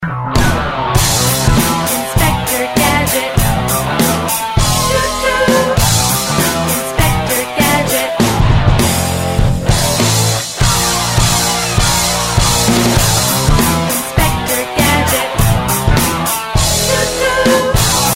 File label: sms